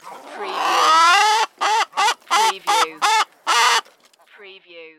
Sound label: Chickens Squawk